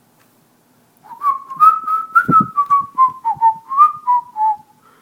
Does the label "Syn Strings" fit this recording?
No